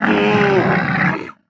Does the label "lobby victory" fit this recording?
No